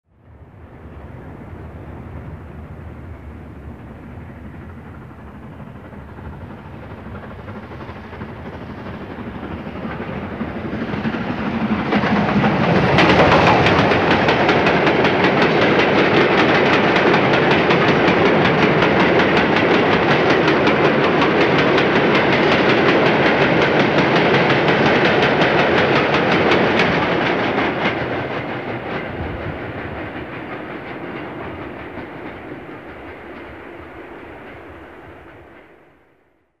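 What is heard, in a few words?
steam locomotive approaches and passes fast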